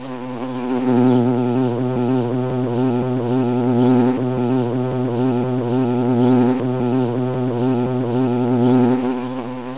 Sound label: FLY; Education Publishing Concepts